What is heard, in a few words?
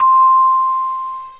CELESTA 84; Dark Forces Demo; Dark Forces Instruments